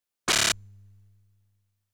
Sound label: Thunder Launch